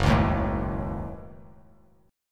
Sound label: Gb Minor 6th; strummed; JGuitar